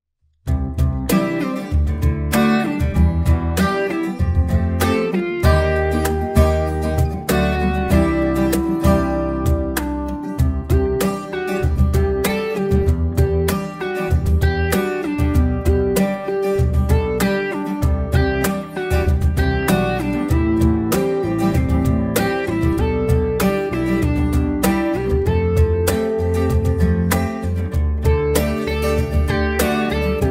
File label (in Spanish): pista guitarra